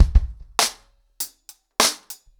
100BPM, ACOUSTIC DRUMS; The Roots Drumloops

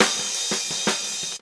REAL 86, DRUM LOOP; Ueberschall; Jam Box

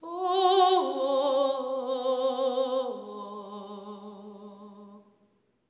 LAMENT

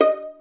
Violin Pizz D#4; Instruments & Percussions; InVision Interactive; Lightware VOL-1

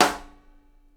SNARE, Live Snares; PlatinumPhattCD1; E-MU Producer Series Vol. 8 – Platinum Phatt

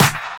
Snares; Vengeance - Essential Clubsounds Vol.4

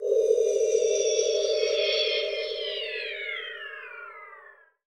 sfx sci fi; Masterbits - Switch FX

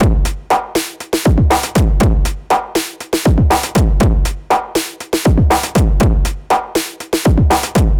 NRG Break, Trance, Drums, Breaks

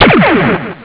shot; Amiga Soundtracker Sample Packs

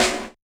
Yamaha DJX 2B, SD Elect.

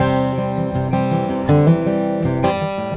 A GUIT C MAJ, 80BPM; Best Service; World Colours

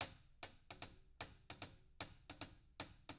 HIHAT LOOPS, Living Drums; Sampleheads; Peter Erskine

